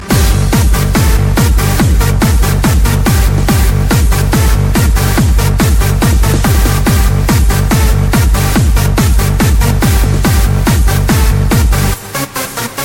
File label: Bass